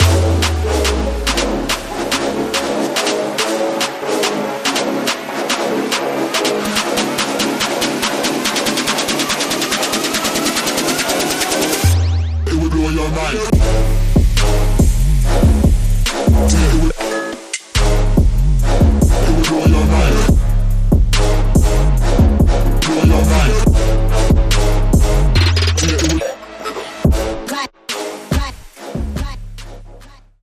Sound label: Blowyourmind, No Vocalchop